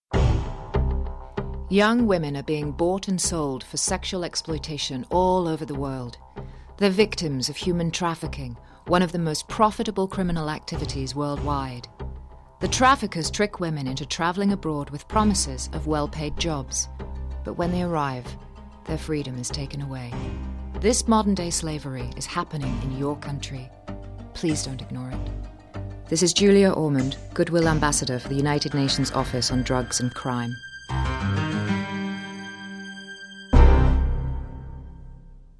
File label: music; julia ormond; psa